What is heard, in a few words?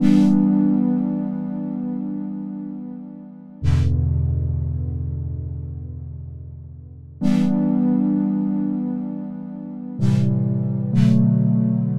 Soft Jazz